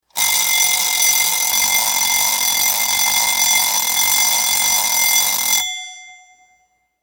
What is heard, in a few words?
old clock